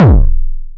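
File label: bass drum, drum machines; 300 Drum Machines; E-MU Orbit-3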